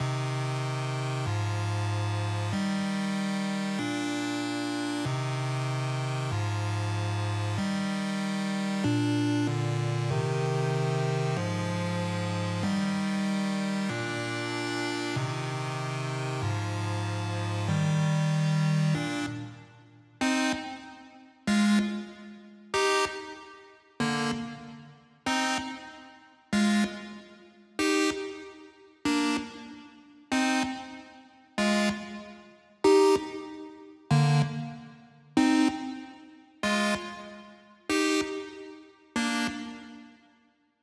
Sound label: Intro - 95 BPM D# Maj Chords, Hip Hop; Melody Loops; Loop Stems; Cymatics